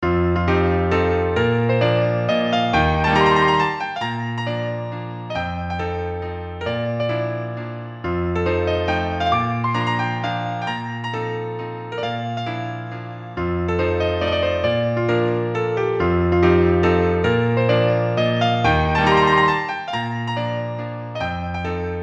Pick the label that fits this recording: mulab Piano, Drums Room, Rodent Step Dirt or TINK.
mulab Piano